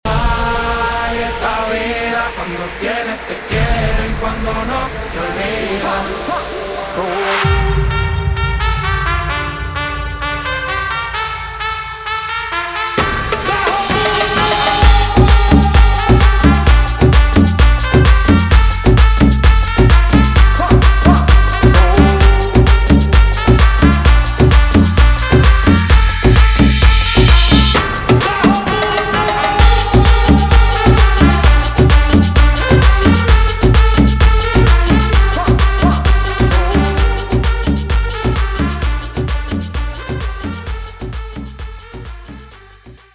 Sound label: guaracha Remix 130 bpm